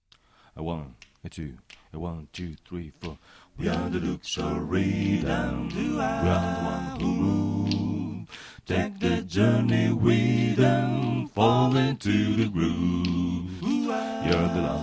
Lead, barbershop tags

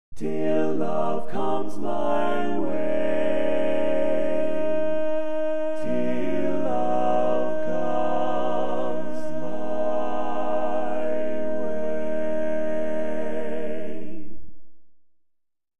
Bass, barbershop

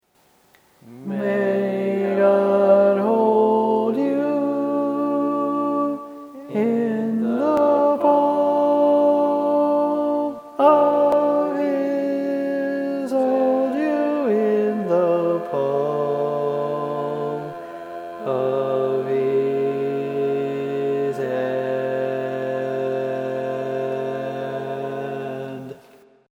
Bari, barbershop

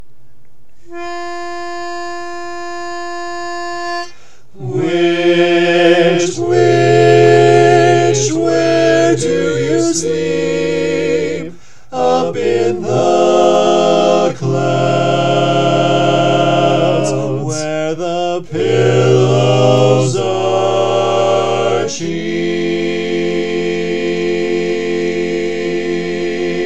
All Parts, barbershop

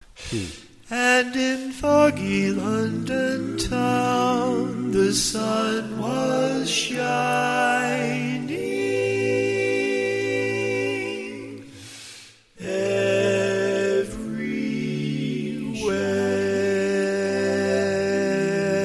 Lead, barbershop tag

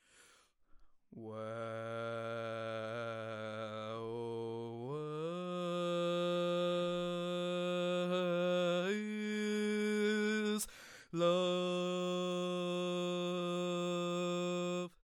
Bass, barbershop

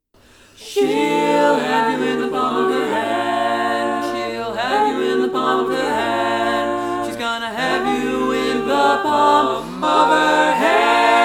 All Parts, barbershop

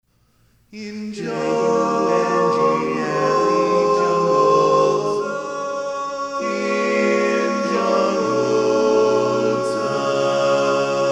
Bass, barbershop tags

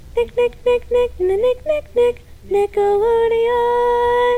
Tenor, barbershop tags

